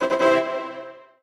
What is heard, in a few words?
level Up; Dr. Driving 2; Android